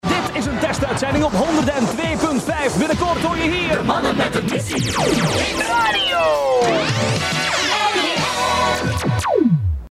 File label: jingle